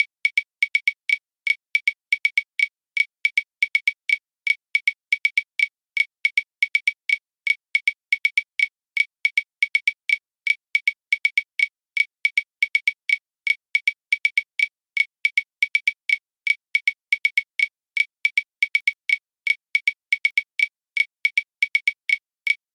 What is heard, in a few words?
palo cata